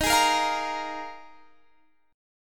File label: Eb Suspended 2nd Suspended 4th, Guitar, Standard; Ebsus2sus4 strummed; JGuitar